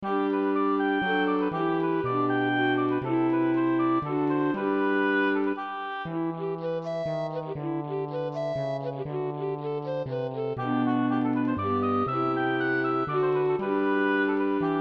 sonate pour hautbois orgue

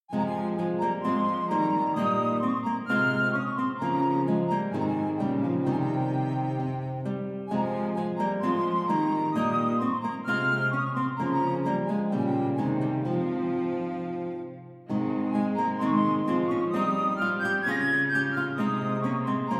trio pour fla bec guitare violoncelle

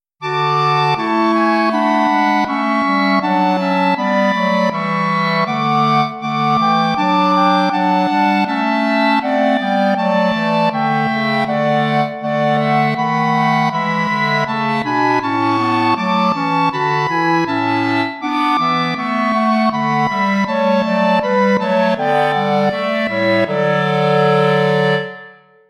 choeur; bach johann sebastian; christ est chantons

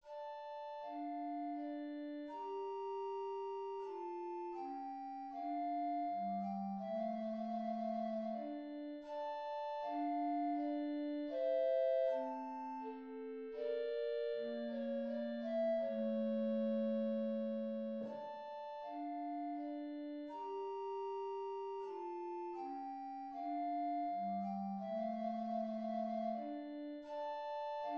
sarabande mineur; Arcangelo Corelli

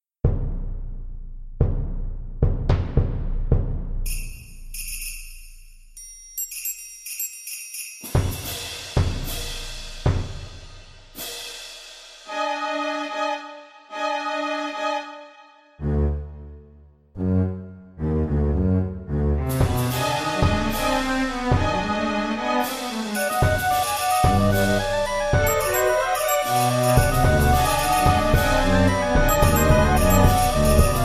pour cloches tubulaires orchestre percussions; cocorullo fabrice pla thore

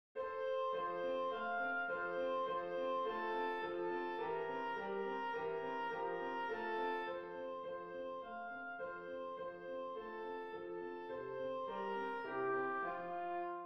danse allemande; beethoven ludwig van

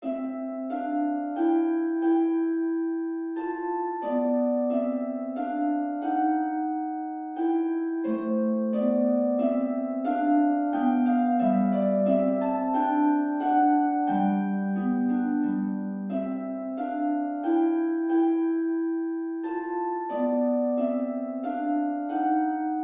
menuet

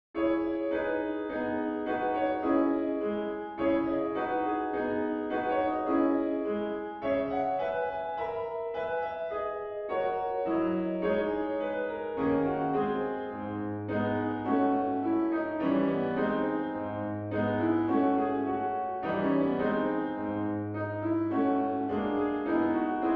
menuet en do dièse mineur; Ravel, Maurice

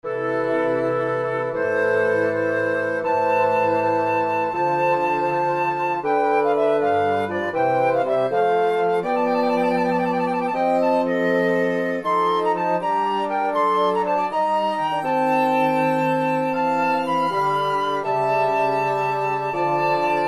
quatuor

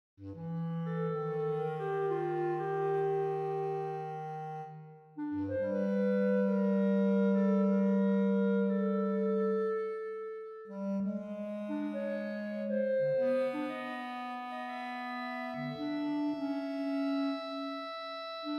miniature pour clarinettes; lades neffous

